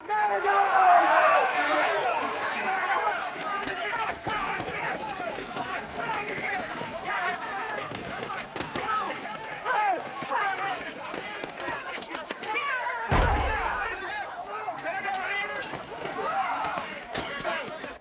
chaotic crowd riot with scuffling and shield impacts; foley